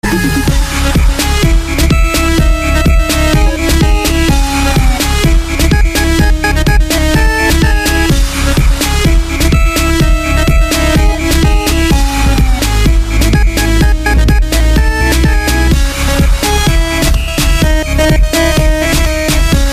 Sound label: 8-bit